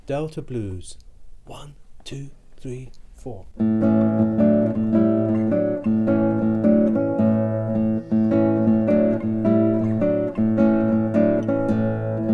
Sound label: Delta Blues